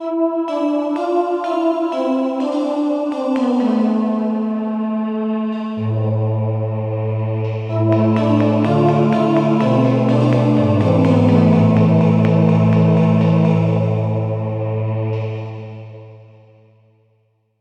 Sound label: echo drops